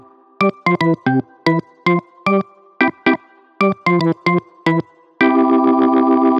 Jazz Organ; регги

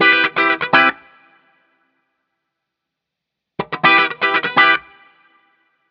Fill in

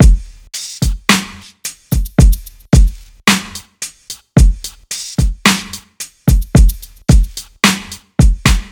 soul; Slowmotion

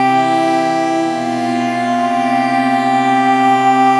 Short Saw, pad

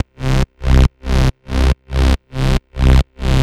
Saw Bass

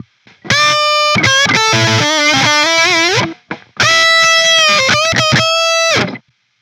Guit Solo, гитара

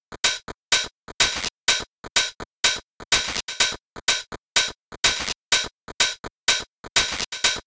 Club Beat D, dance electro club beat d; drum сэмплы

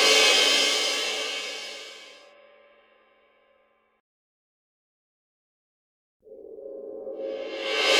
Cymbal Roll; 120bpm

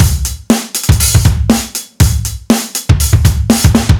Metalized, alternative

